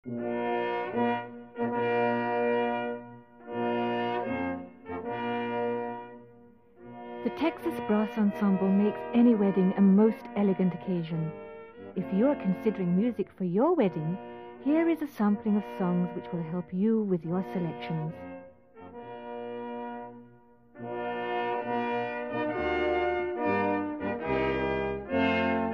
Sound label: brass ensemble